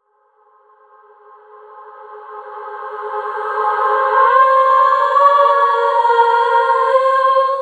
Riser Vocal D

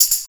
Tambourine Double

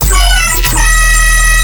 SoundFX; Robots Can Cry

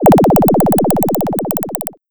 Sound FX Glitchy Kicking

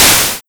Noise Powerup

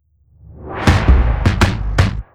Tom Roll n Break

